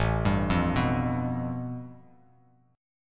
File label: A 7th Suspended 2nd Sharp 5th A Bass Standard; A7sus2#5 arpeggiated; JGuitar